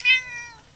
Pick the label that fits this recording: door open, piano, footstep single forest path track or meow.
meow